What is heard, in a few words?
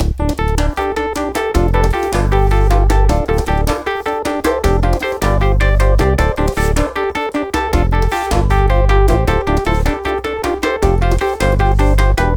djembe